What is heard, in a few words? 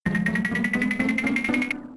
fridge